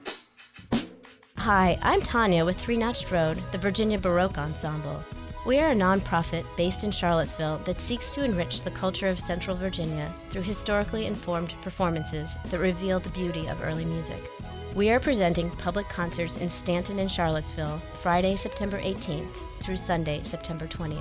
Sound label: Cello